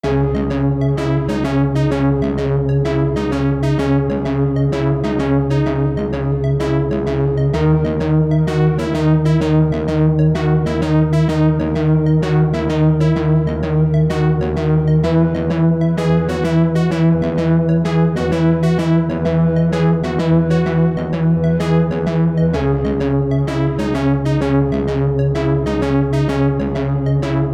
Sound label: wave shifter arp squares